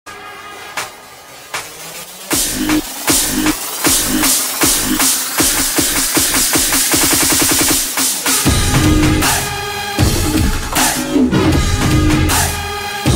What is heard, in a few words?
Ringtone BGM; Theri Movie